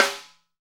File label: SD, Real Kit; 90 s Sample CDs; Sound & Vision - Gigapack I CD 1 (Roland); Real-Kit m14